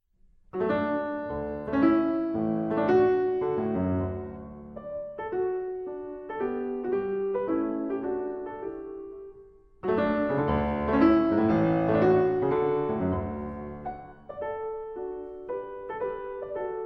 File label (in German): Sonate